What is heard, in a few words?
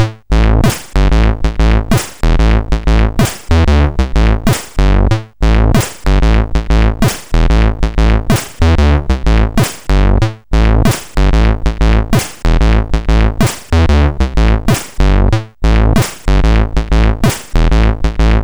Beats tune, sid CSG8580R5